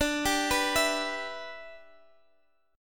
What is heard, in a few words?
E Minor 7th D